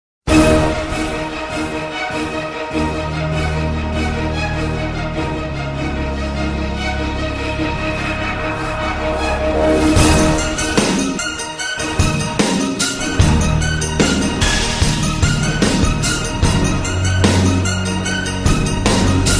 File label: breaking news ringtone